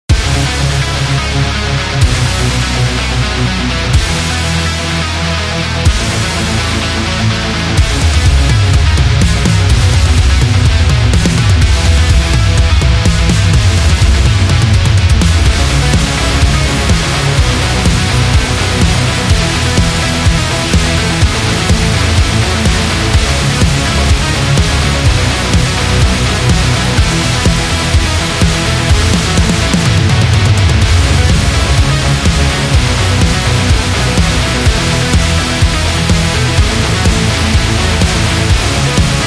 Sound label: Rock Loop